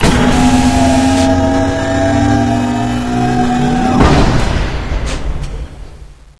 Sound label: core door open; dm criticality